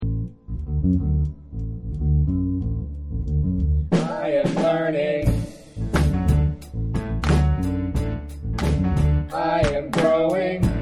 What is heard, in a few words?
Vocals